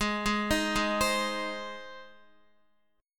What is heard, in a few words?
Ab Major Ab, Guitar; arpeggiated; JGuitar